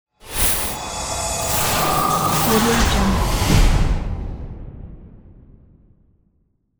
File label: horror whoosh; infinity-sfx-productions